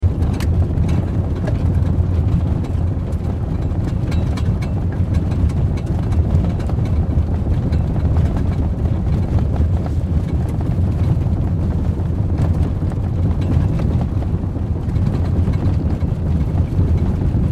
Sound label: Ford Explorer 2008 Drive Dirt Bumpy Off Road Loop Interior; Cars